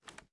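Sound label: inspect, shotgun; gmod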